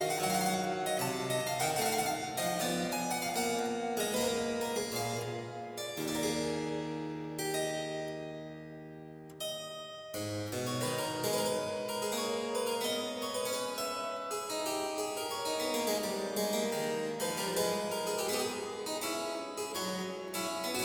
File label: partita a moll bwv 827 allemande; clavier buechlein fuer anna magdalena bach 1725